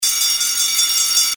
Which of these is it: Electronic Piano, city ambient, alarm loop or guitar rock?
alarm loop